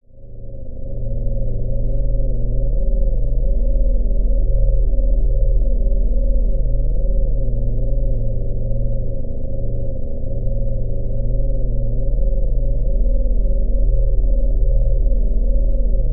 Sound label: science fiction force field long pulsating slight growling tone